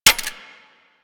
Pistol Mech; Kohm

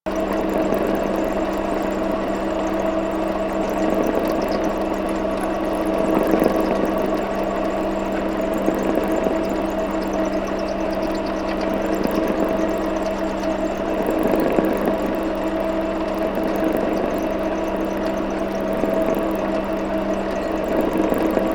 Swarm Deploy Base Loop; Warframe; Entrati

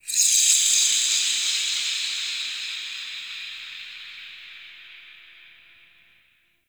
Under City High Creature Echo

